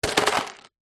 food drink empty soda cup just ice fall over fast food; zapsplat